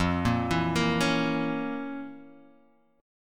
F Major 7th Suspended 4th Sharp 5th, Guitar, Open F; arpeggiated; 5,5,4,5,4,x; JGuitar